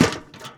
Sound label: trash metal drop; Blind Drive